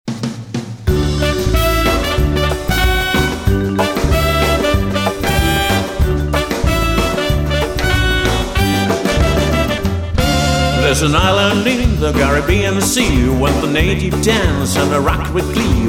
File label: Latin Dance